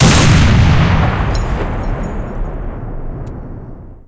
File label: cannon 100mm d10t shot, tanks; cannons; War Thunder